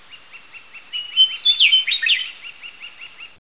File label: Lark, eastern washington